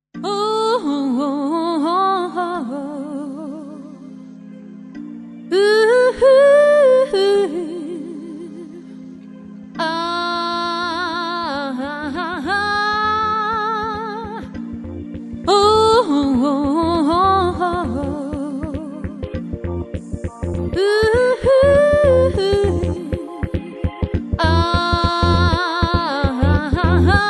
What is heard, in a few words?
synth; miami